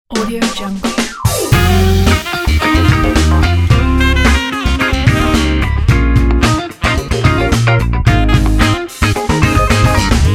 latenight talk show

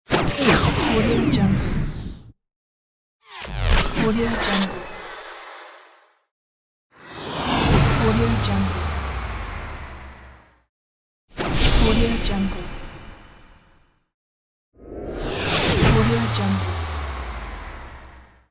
futuristic transition sounds

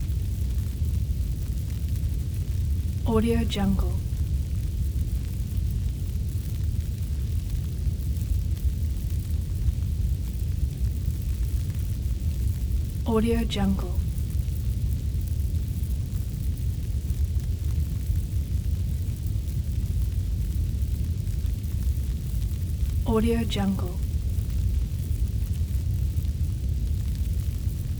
fire place heavy hot blazing wildfire distan; christmas loop

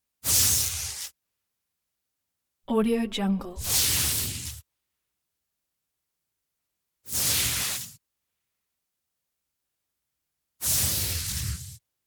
white light short searing bursts rumble hiss

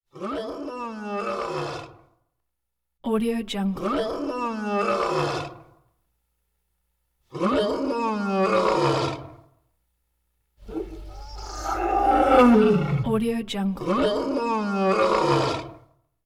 lion roar painful bellows cries whines gargle; prosoundfx

